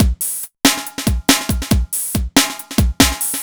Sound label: Synth Loop BPM140